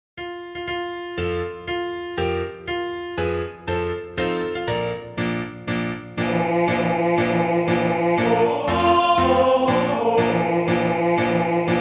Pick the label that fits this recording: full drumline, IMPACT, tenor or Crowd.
tenor